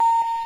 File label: Doorbell (retro)